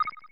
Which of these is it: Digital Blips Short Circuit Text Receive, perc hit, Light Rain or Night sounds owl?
perc hit